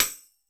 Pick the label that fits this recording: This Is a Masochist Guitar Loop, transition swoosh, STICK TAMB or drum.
STICK TAMB